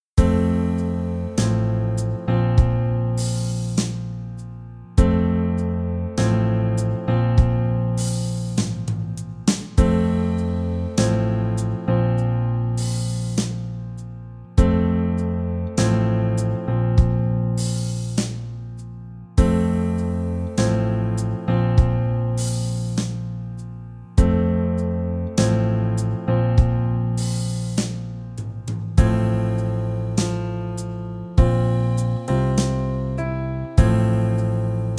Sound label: Playalong, horn; easy beat vol 1 horn